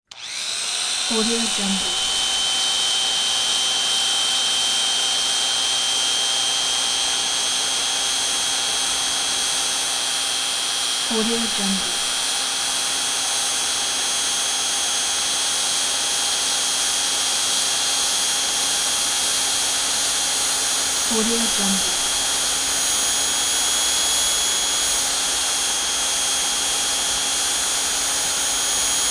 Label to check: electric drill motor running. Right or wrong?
Right